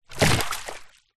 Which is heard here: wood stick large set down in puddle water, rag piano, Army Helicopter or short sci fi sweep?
wood stick large set down in puddle water